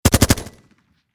Warfare Machine Gun Hkg36 Short Burst Close Perspective; Heckler Koch